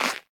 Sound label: Move Snow Right